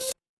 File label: ui beep